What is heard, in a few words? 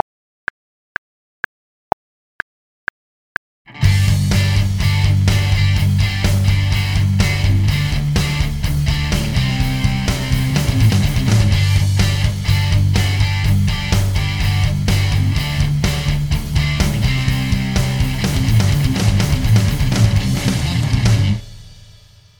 BT solo; AULA SANTO ANGELO